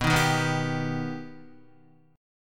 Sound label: B Augmented B Guitar, chord; strummed; JGuitar